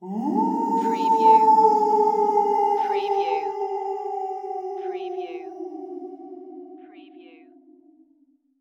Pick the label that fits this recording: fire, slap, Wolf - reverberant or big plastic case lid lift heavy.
Wolf - reverberant